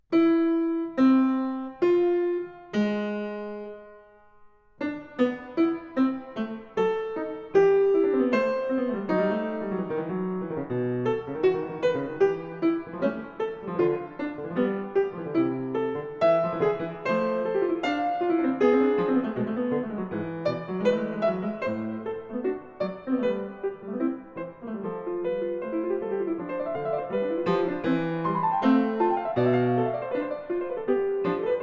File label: Fugue in a-moll, Keyboard; Bach; The Well-Tempered Clavier - Book 2; Fugue No.20; BWV 889